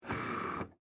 Arcade Game Wheel, Technology, Arcade, Game, Wheel, Ball, Spin; Arcades